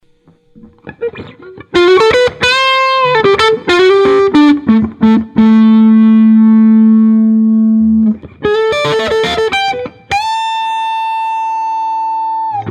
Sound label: TC-15, les paul